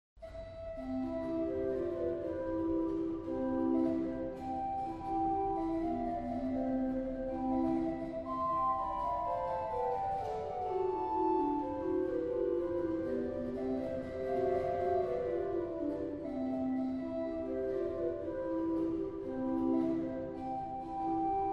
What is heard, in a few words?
Flutes, orgel